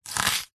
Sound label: spring onion single chop with knife